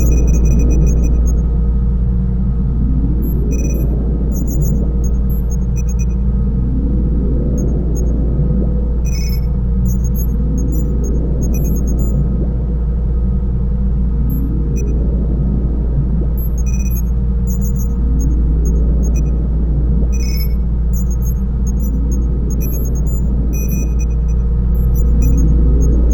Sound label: Ambience Computers; Phoenotopia Awakening